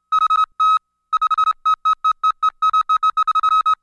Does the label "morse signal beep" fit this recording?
Yes